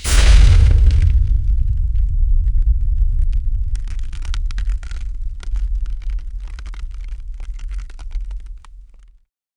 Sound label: hard ice impact bass boos